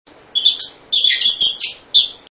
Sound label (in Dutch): poot, agaporniden; v 3 mnd